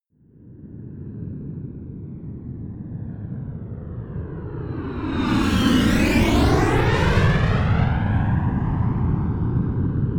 military jet a-10 thunderbolt flyby close

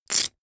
poker chip stack big rattle short

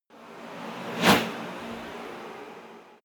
fly by electronic soft fast

